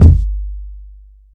long room reverb bass drum sound g key, kick drum sample